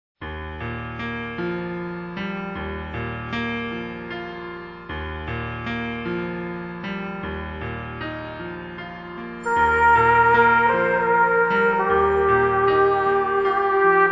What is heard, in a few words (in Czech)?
ticha noc, orchestral